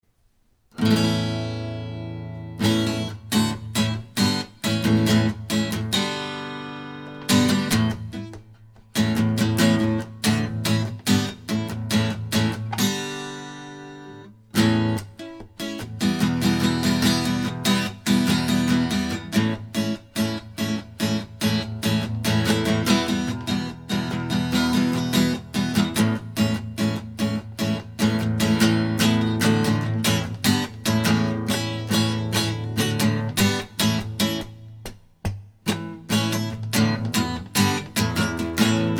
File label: A D G Bm Instrumental